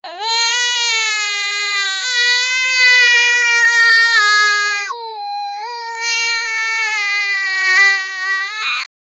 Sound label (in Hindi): 8 saal ki bache ki, crying